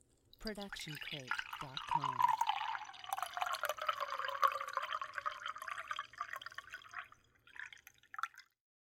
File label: liquid cup pour; soundscrate